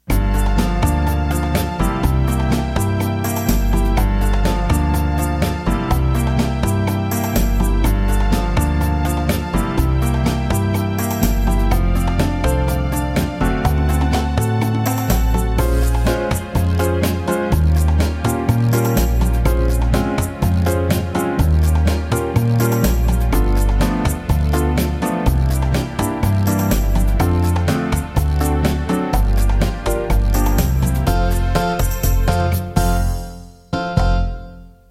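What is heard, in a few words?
Latin Unplugged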